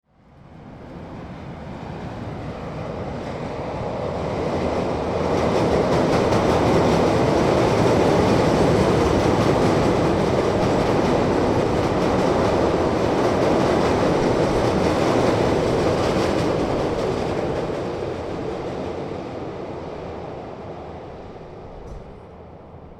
Chicago Elevated Train By, Exterior; Elevated Train By , Medium Close Exterior Perspective From Underneath Tracks

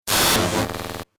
Static Glitch; sound design

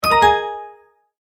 multimedia tone alert; Tones; Sound Design; McKinney Sound

